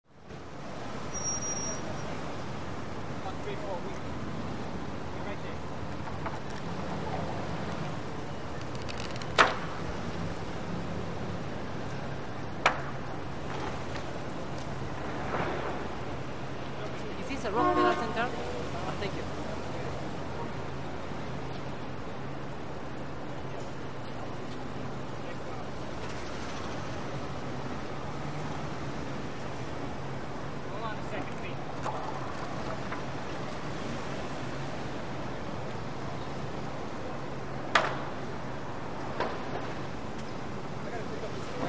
Light City Traffic Am, big city

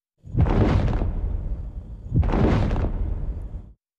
Dinosaur footsteps; animals wild dinosaurs

